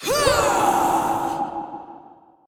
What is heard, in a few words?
Enemy Boss Attack Heavy; bloom minigame